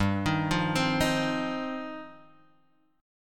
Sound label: G 7th Suspended 4th Sharp 5th G